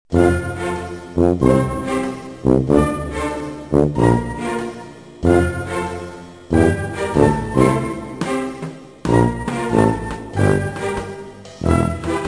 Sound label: Loops Eggs